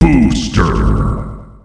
Booster; csgo; quake

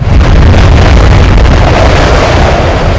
blizzard

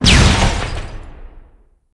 frostbolt

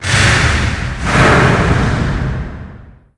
adrenaline rush; csgo; wcs go

